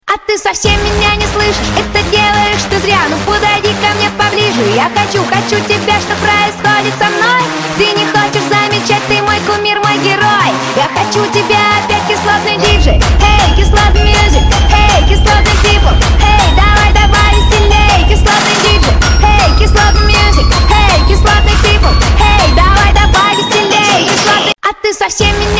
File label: Slavic Techno